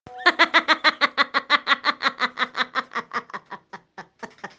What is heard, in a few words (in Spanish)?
risa